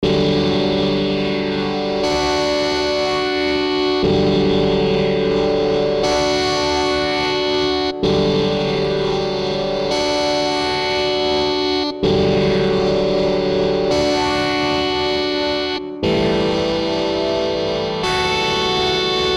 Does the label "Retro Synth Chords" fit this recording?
Yes